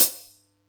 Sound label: Yamaha EX-5